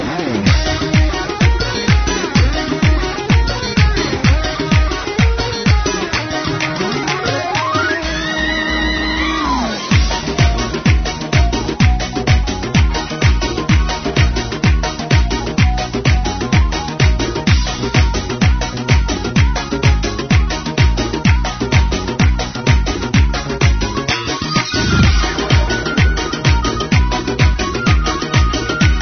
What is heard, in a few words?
Pretty Rock Irani